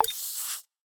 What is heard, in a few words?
click correct; Ben 10 - Spot The Difference